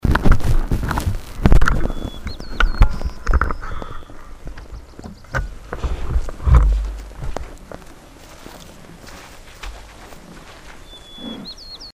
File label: Bear Attacks Audio Equipment; alaska acoustic highlights; MAMMALS